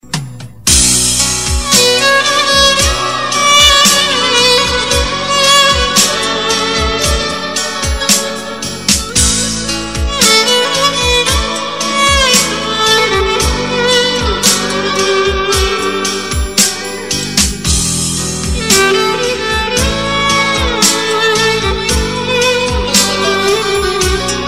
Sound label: Violeen